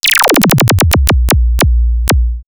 Ufo Collapse, synthfx; Concussion; X-Static Goldmine

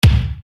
Bass Dr, EuroDance Bdrums

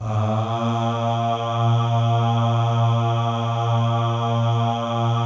MALE AHS; Spectrasonics; Symphony of Voices

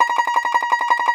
Tenor Banjo, Plucked Strings

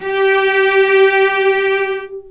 VCS ATK G4 L, VCS ARCO, String Collection

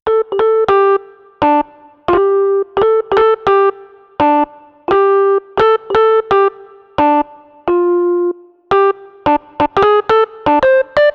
Rhodes, Cinematic Funk

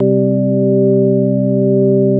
DM ORG1, Dance Mega Synth

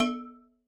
Low Ethnic, Alesis D4

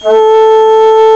FLUTE, WIND

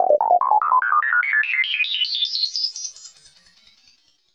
STAIRS UP, Anime Sound FX; COMIC

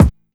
Bd Stiff, Kick Drums; Zero-G; Soundclash

